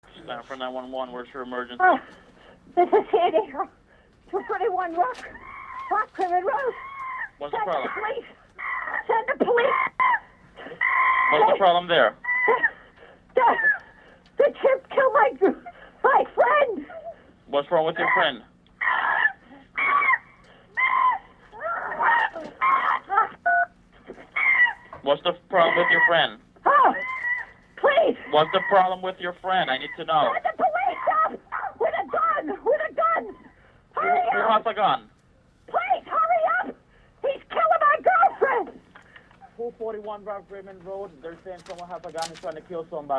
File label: chimp attack